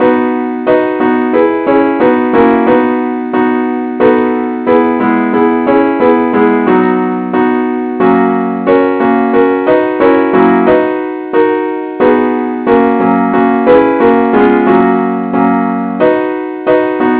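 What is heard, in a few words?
advance accompaniment key B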